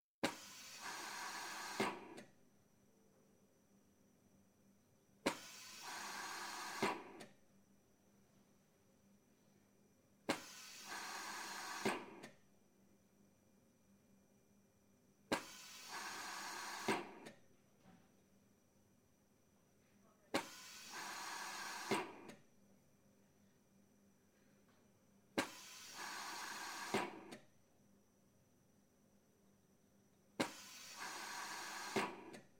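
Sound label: breathing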